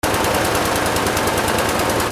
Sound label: GARAGE